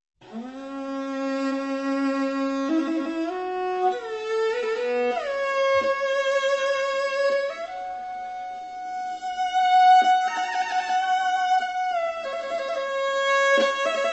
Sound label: Folk Traditions; World Sounds